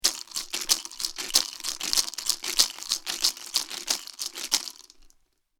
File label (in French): son Maracas peau; Percu; Duperrex